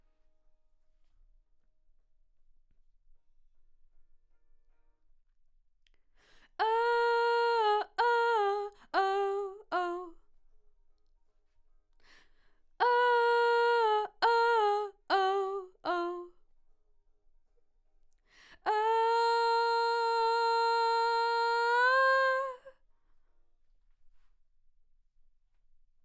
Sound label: Vox start new